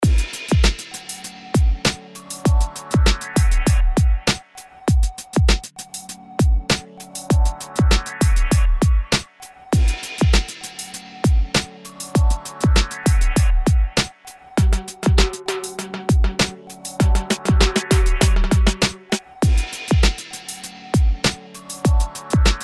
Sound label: Hip hop beat